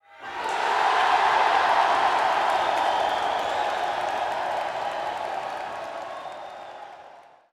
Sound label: crowd cheer; Hollow Knight